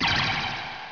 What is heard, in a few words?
Critical Stop; RESURRECTION